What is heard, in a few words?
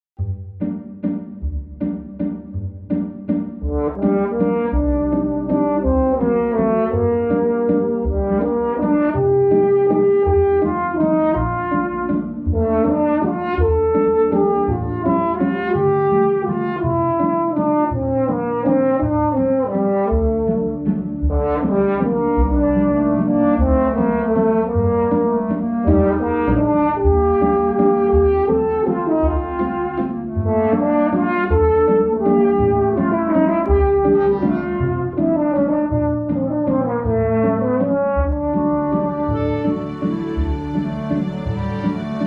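French horn; tv movies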